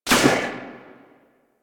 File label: Pistol Shot C; Bard